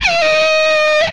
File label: Skid, retro arcade; MMRetroArcadeSoundsPack; Vehicles